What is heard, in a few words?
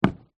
footstep single wood decking boot; foley; zapsplat